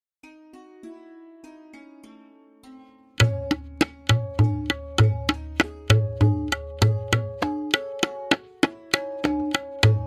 Variation 9-Played with Lehra, tabla, Benares Kayda; Tintal; Tintal – Benares Kayda 1 Variations (Devanagari)